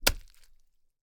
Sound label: gore, large metal blade wet stab slash and remove from flesh, medium; Timothy McHugh; Gorification [HD]